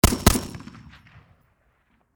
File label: Warfare Machine Gun Springfield M-14 Double Shot Close Perspective